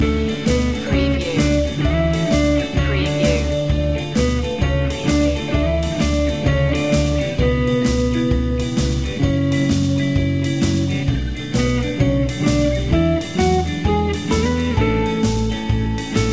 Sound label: swing loop